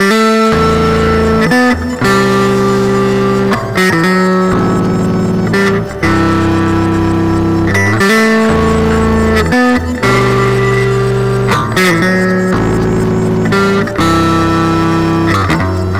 Processed Electric Bass

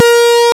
Fairlight CMI IIX, ELECTKBD

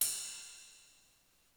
Ride, Percussion; Giga Samples Collection; ELQ-DrumQit; rock toms

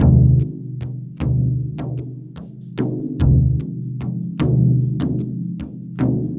Frame Drum Harmo, Ethnic Percussion; Sonic Foundry (Sony Creative Software); Ambient Atmospheres & Rhythms

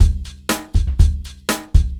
Breakbeats loop, 70_s Breakbeats

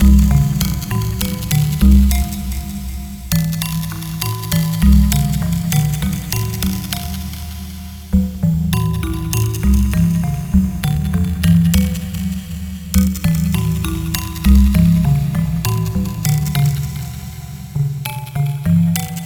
Combination Rhythm; Sonic Foundry (Sony Creative Software); Twine-IDM; Rhythms; Combinations

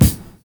LOW TOM; Univers Sons - Kitch 70s

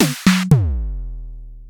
Clavia Nord Drum, Samples Sliced

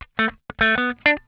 PICK IT, Funk Guitar; Zero G - Funk Guitar

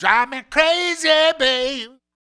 Funky Mama Vocal, 70s Analog Funk

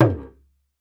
Talking Drums, c2; Retro Sampler; EMU E-III

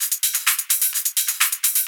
Hihat Loop 128 BPM

